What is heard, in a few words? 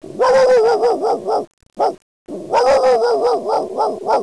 DOG